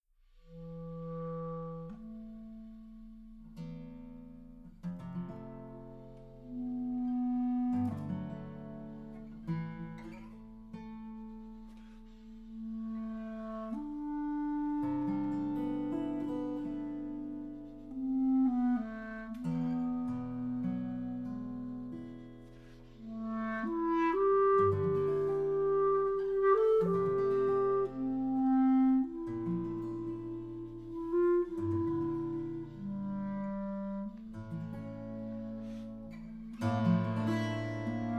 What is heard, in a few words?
Clarinet Guitar